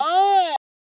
formant filter vox hit, synthesized voice

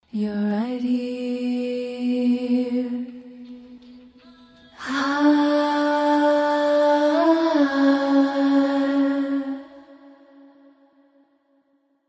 high, acapella; vocal acapellas; various acapellas

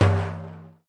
arabic perc, Arabic Percussion Kit

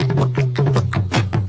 159 beatbox tomtom roll; 141 to 160 bpm

